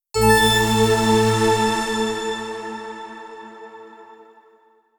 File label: Jingle, Treasure Box